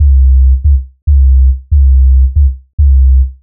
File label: 140 C BASSLINE, Dubstep; Loopmasters; The New Sound Of Dubstep; BASS LOOPS